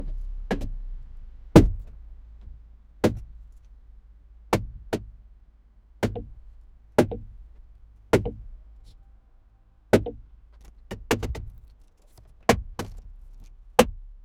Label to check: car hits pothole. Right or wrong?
Right